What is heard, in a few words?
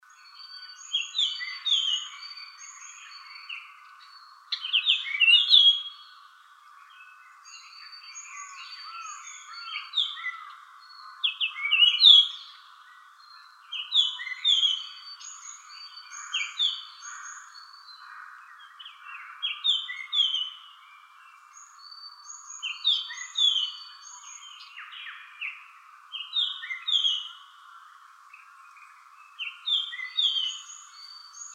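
ikaru S, Japanese grosbeak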